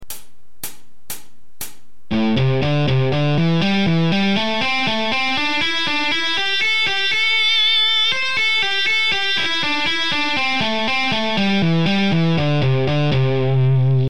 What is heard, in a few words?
A Minor Pentatonic Exercise, guitar